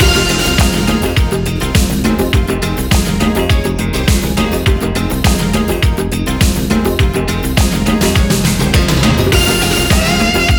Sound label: freespin loop; netent